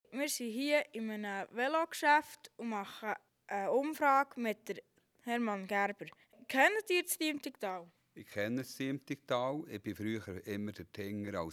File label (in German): Jodel Mann; Diemtigtal; Horbe; Horben